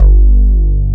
Synth Bass, Roland

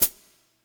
Hihat Pedal, Yamaha PSR E305

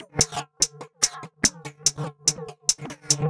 145 Hats, Drum Loops; Zero-G; Soundclash Nu Dance Electro; Acidized Wavs; 145bpm; Buzzing Fly

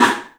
STEEL, Drums, ROOM SINGLE; 3D Sound

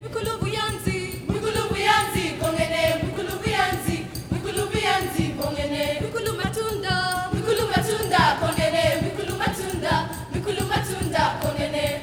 AHO D3; Spectrasonics; Heart of Africa 2

